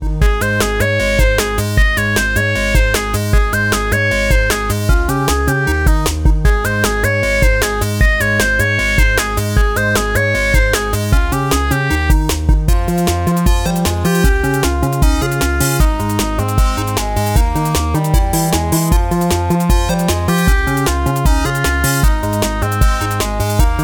Clean Clank Fast Intro; willy jetman astromonkeys revenge gamerip 2020

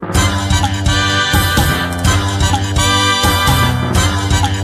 Jingle Clear; Taiko no Tatsujin DS Touch de Dokodon

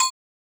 Tock; Minigolf

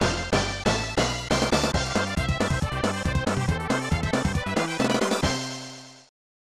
V Goal; J.League Excite Stage 96; SNES

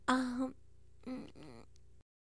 Sex Lines Kiss open; hilzartov fairy size doesn't matter

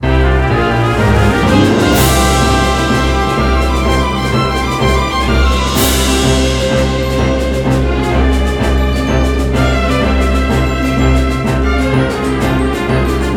Dungeon Clear Intro

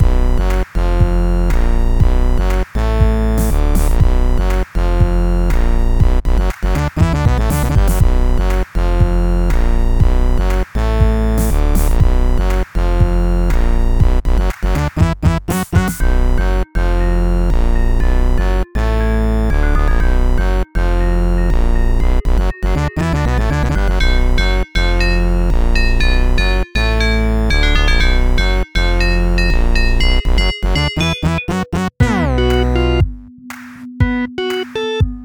Funky; Underhero

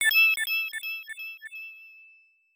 Coin; Raimais; L-System

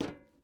metal step; teardown; ps5 windows xbox series xs

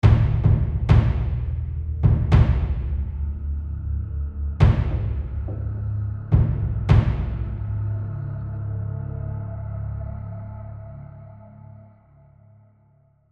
Tension High; the last of us

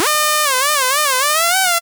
Beat

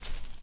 Footstep grass

